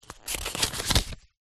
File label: household book very small page turn; zapsplat